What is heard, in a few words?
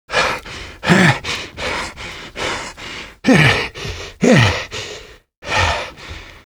fish out of breath; Half-Life 2 Lost Coast